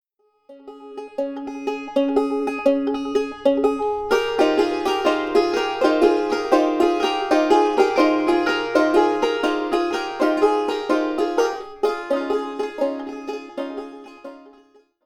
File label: banjo